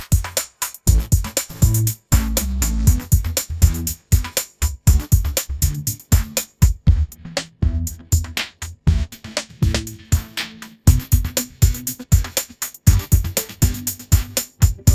bright pop flute ringtone

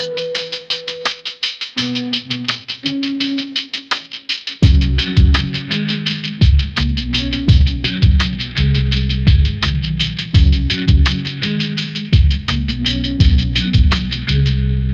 mellow drive rock beat; ai

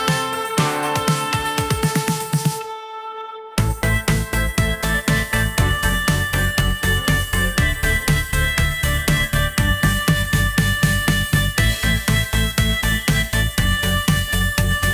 anthemic pop celebration

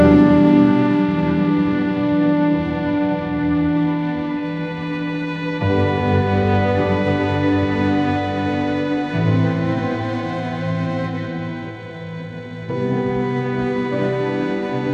orchestral strings

